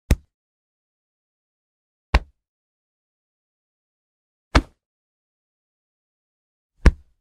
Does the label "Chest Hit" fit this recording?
Yes